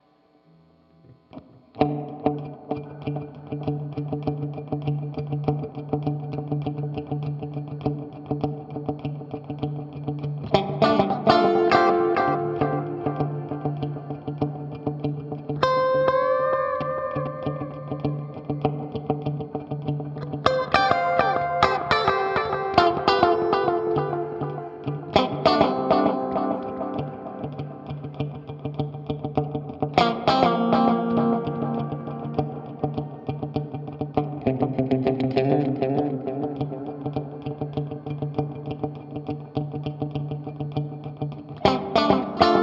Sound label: Echo Pitch Plate